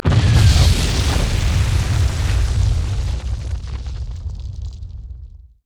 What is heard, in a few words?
turret dying; LoL